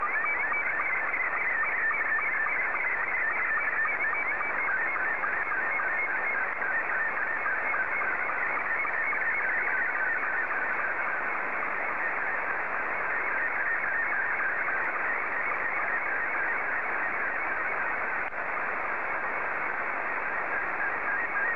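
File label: SSTV, Scottie 2; JA3QJQ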